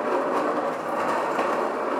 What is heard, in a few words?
metal scrape